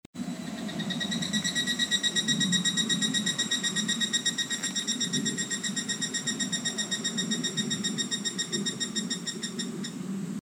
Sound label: voice cicada